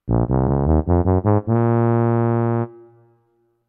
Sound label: tuba short